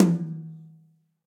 Tom Multirod High Dry Acoustic; Drum Hits; Ableton; Factory Packs; Session Drums Studio

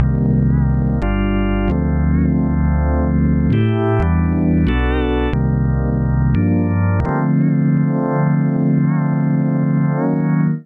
Phantom Rotation C Minor 90 bpm; MIDI Clips; Tonal; Accompaniment; Ableton; Factory Packs; Chop and Swing

